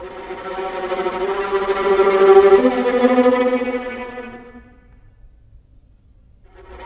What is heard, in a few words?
Viola, Cinematic; Sonic Foundry (Sony Creative Software); Orchestral Series VOL-3 - Cinematic